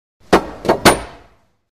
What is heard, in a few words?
Bowling Ball Captur